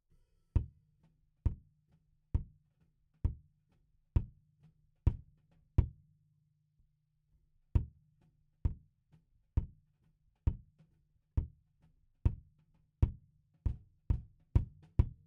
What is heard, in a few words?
Kick In